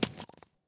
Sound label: grenade grass; STALKER; IWP